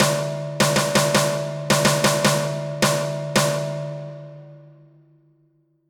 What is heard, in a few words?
roll